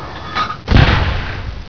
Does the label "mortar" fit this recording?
Yes